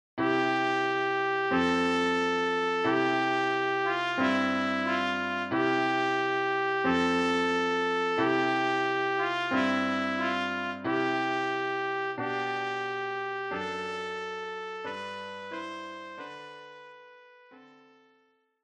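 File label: Trumpet; O du fröhliche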